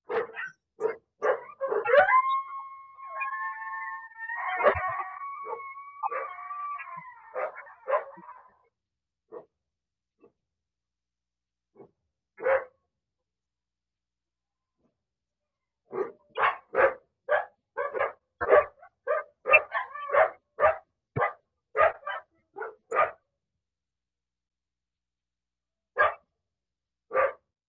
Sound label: coyote huff sound